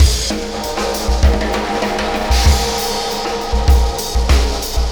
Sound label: Oscillation Beat